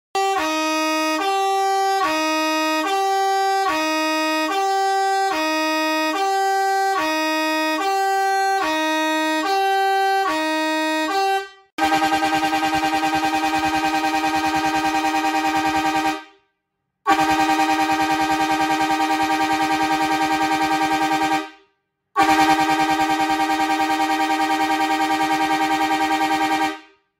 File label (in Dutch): hi do TGV geluid